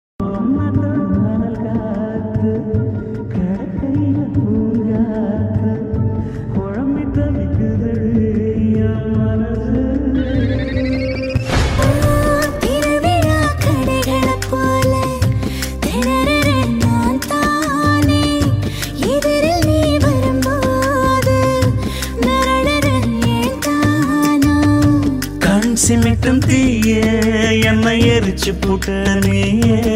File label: tamil love song; dhanush